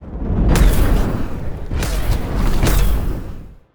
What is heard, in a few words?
fire rock fall